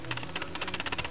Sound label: punch card; Freeverse Software; Enigma v1.4